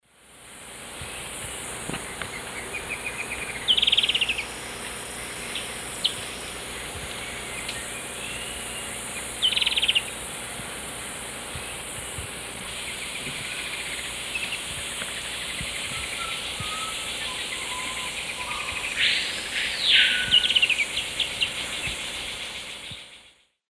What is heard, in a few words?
Myrmotherula brachyura, calls pair; S. America; Eastern Lowlands